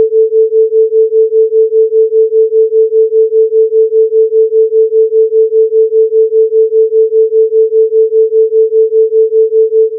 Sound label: Wobble 440 Hz